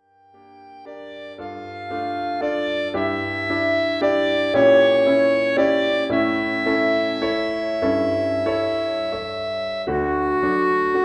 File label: clarinet in Bb solo + PA